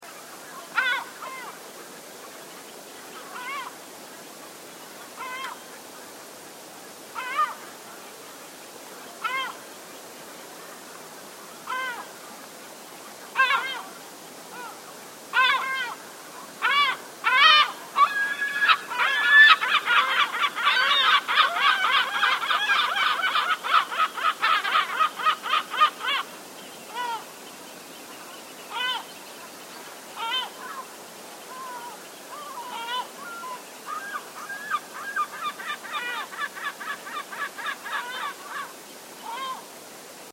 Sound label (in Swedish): Silltrut